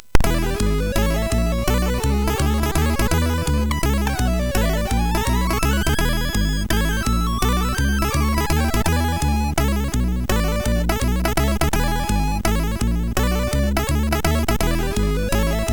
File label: Modern Jazz, MOS6581R4